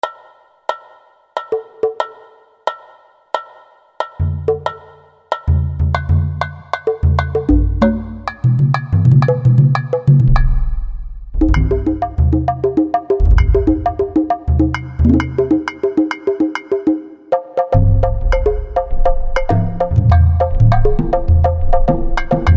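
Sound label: Latin Percussion; World; Roland SR-JV80-18 World Collection Latin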